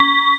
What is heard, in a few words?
bell harm